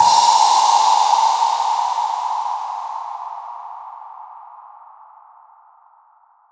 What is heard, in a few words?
Artcore Crash; osu